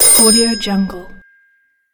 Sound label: old rotary telephone short ringing